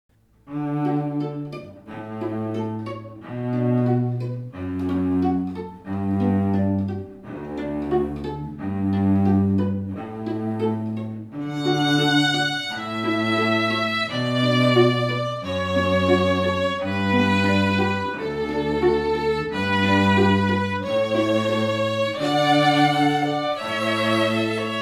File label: String Trio; Pachelbel Canon